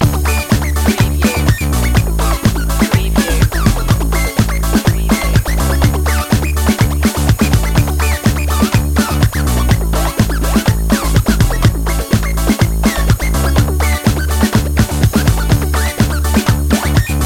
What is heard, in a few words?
techno chicken loop